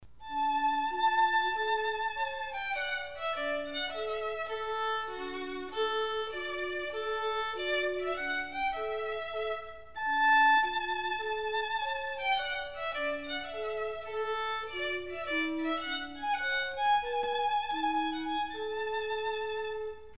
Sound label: Purcell Trumpet Tune